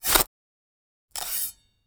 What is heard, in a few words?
Industry Shovel In And Out Dirt